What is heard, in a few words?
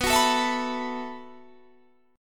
B 7th Suspended 4th B